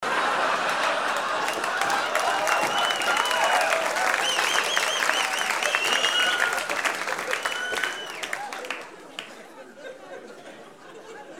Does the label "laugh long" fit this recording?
Yes